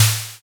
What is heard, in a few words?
snare